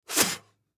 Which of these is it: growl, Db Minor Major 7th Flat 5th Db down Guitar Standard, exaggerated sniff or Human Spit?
Human Spit